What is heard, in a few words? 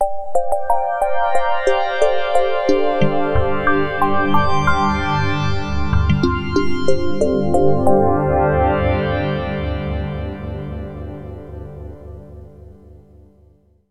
5th Space Xylo